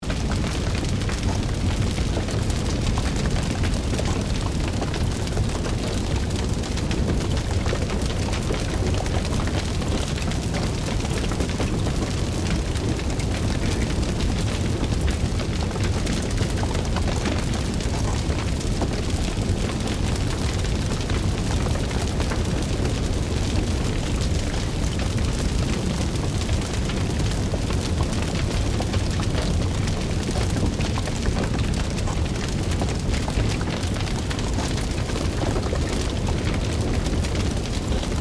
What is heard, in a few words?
Fire Heavy Crackle, Wood, Loop; Flames; Objects